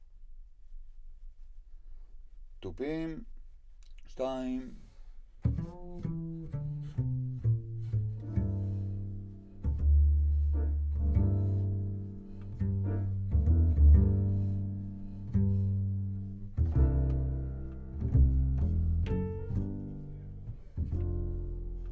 Bass Bot

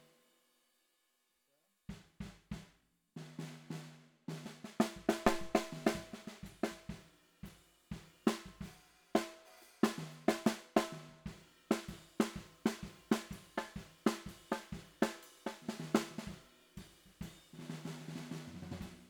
SNR Bot

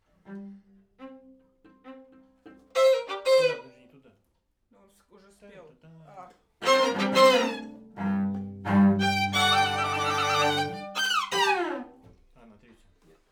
Main Strings

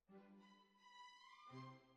Cbasses

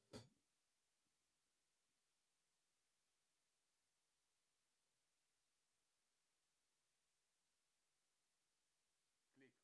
HiHat, 94bpm

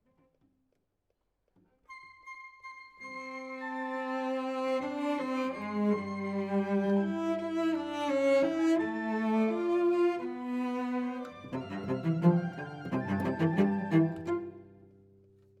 Cello Solo

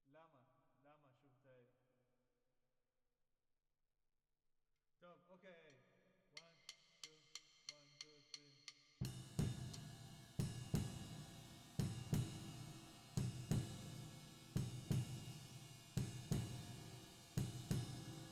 Drums Verb

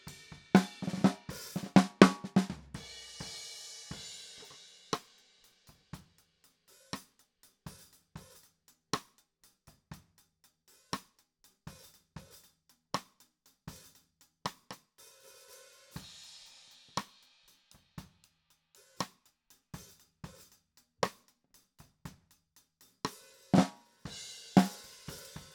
Snare Top

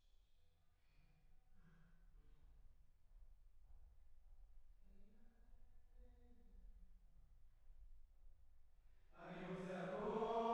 Choir

